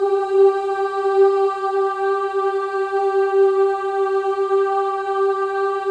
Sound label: OO G4, WOMEN; Spectrasonics; Symphony of Voices; WOMEN OOS